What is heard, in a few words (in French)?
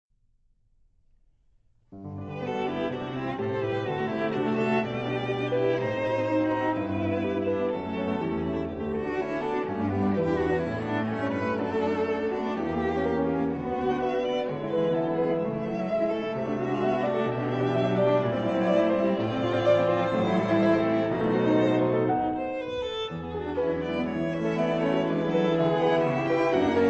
Quatuor piano